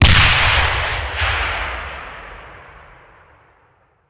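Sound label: Artillery Grenade Launcher; Sound Ideas; Guns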